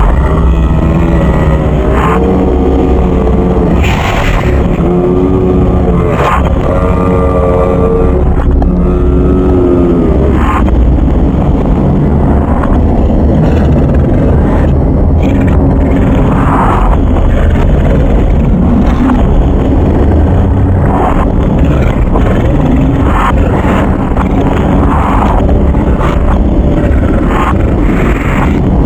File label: ventilator